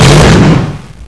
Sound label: photon gun fire, weapons